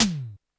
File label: Yamaha RM 50 Toms